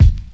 puffy rap kickdrum sound a sharp key; Duration 0:01; Samplerate 44100 Hz

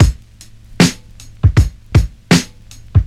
78 bpm drum loop A sharp key; drum loops

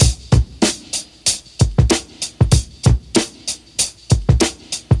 96 bpm breakbeat sample b key

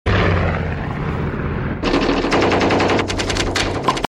machine gun fire with plane

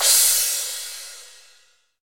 crash c key

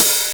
smooth open hi hat g sharp key; Duration 0:01; open hat samples

